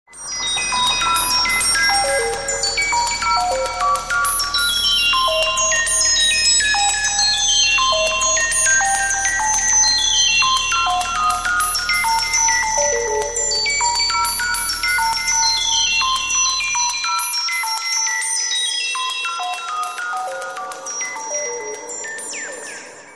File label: theme ringtone